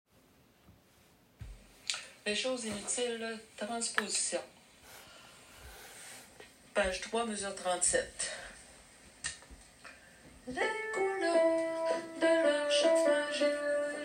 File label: alto modulation